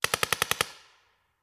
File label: Paintball Gun; Sound Ideas; Guns